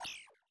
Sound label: ant death